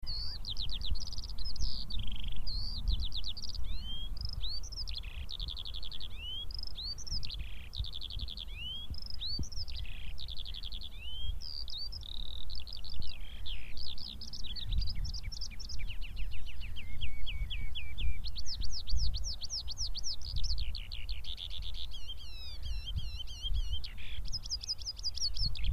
skylark

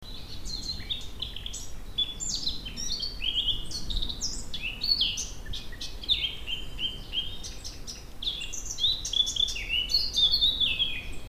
blackcap

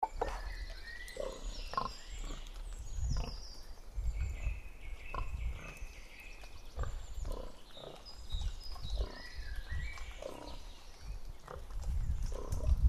pigs stereo

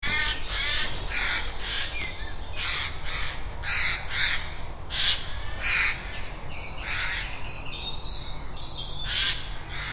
Jays; Everdon Stubbs

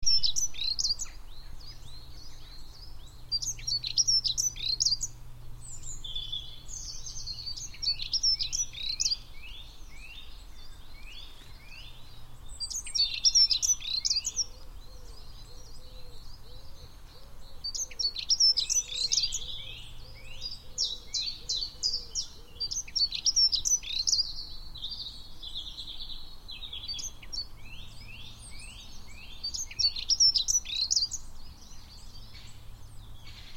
Dunnock heath; Rammamere

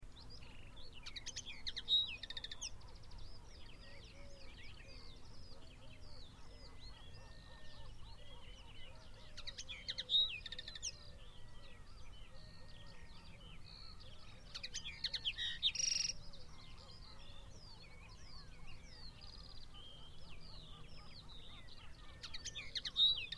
Linnet Rye harbour

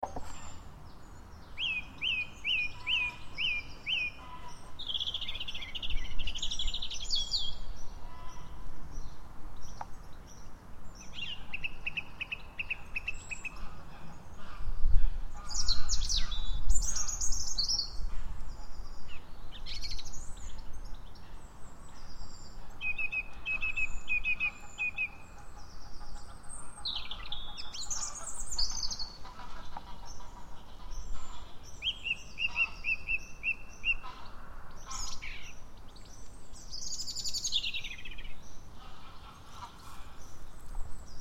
Blackbird robin; felmersham

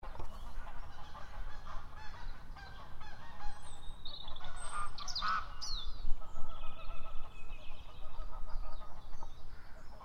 geese; felmersham

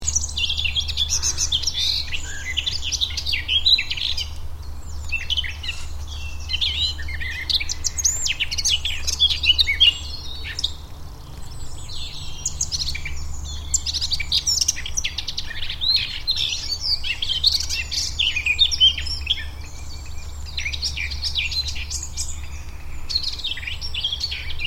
Blackcap, heath; Rammamere

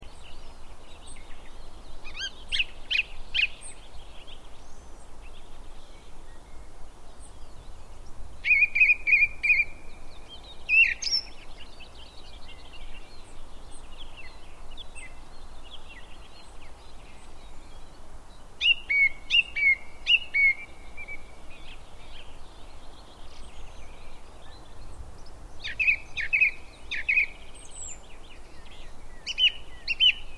Song thrush nr Aviemore

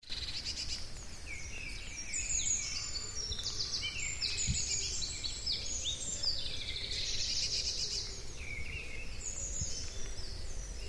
Blue tit; Everdon Stubbs

